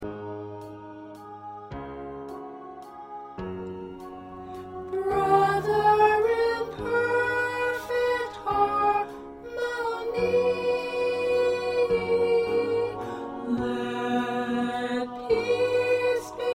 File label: Soprano